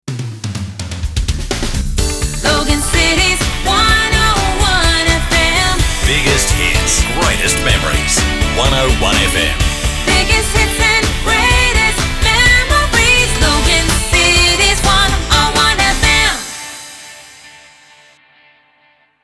Jingle Slogan 126bpm D

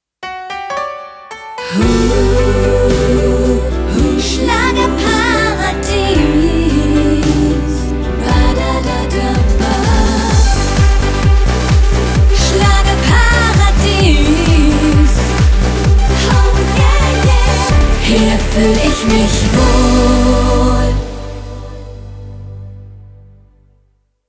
Schlager Jingle Slogan

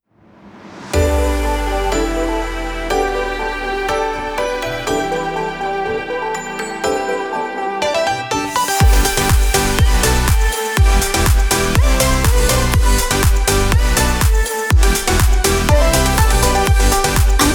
Radio Jingle, 122bpm, G, Ramp; Radio Insuperavel

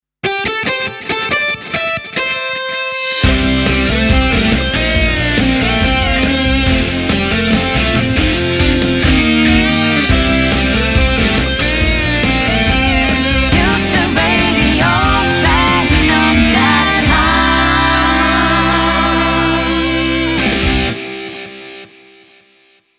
Radio Jingle 140bpm Ramp; Houston Radio Platinum

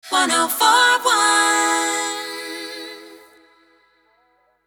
Sung Jingle Positive Country 100bpm ACAPELLA

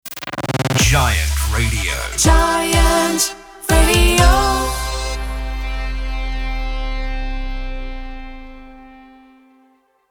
Sung Jingle Radio 124bpm Gmaj Sting; Giant Radio

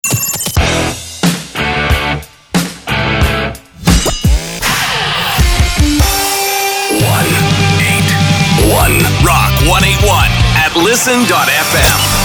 Rock Imaging Montage; Rock 181

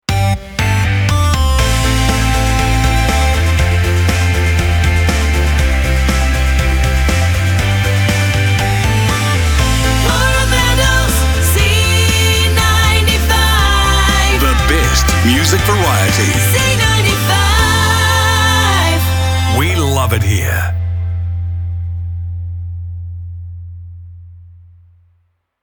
Jingle Ramp; C95 Jingle Package

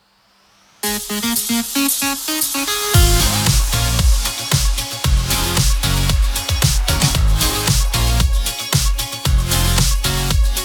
Sung Jingle 114bpm C Minor RAMP; KLove

